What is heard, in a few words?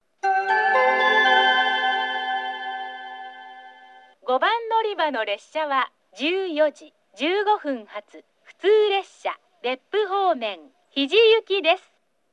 oita local hiji; 5番のりば停車中放送（普通 日出行き）; 大分